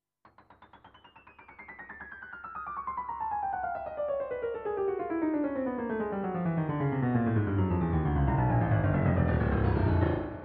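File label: Mellow Piano, digital piano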